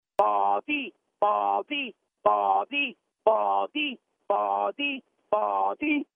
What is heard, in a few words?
Chant